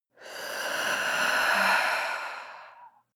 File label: female breath slow out